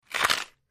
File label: Bite Vegetable Peppe